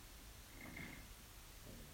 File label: flutter